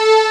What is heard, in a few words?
Music Box; Final Fantasy V